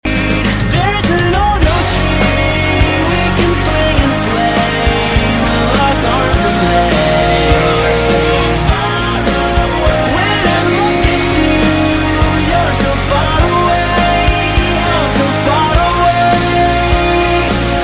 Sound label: Oldies